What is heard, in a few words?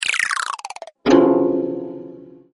wrong